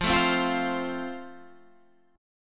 F Major, Guitar, Standard; strummed; chord

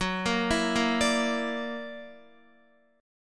Gb Augmented 7th Gb down Guitar 4F,4A,5C,5G,5C,6E; Gb+7 arpeggiated

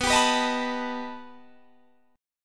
B Augmented 7th B down Guitar Standard; strummed; JGuitar

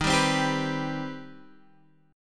C Minor Major 7th D sharp

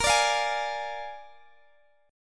A sharp 7th, Guitar, Open D; x,x,20,20,20,18

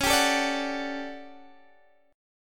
A 13th Db, guitar chord

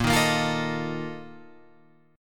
A sharp Major 7th Suspended 4th, Guitar Open F; strummed

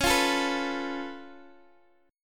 Db Augmented 7th, Guitar, Open G

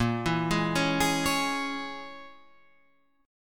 Bb Minor 7th Flat 5th, Guitar, Half Step Down

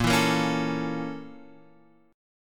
A sharp Minor Major 7th Flat 5th A sharp; A#mM7b5 strummed; JGuitar

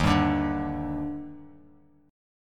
Eb Major 7th, Bass Standard; EbM7; strummed; JGuitar

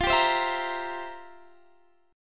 C 7th F, C7/F; strummed

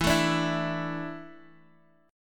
E 7th Suspended 4th E down Guitar Half Step Down

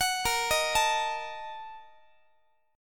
A sharp Major 7th Suspended 4th Sharp 5th, Guitar; arpeggiated

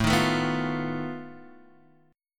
B 7th Flat 5th A, guitar chord

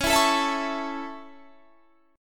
Db Minor Sharp 5th Db Guitar Standard; strummed; Dbm#5 Chord; JGuitar

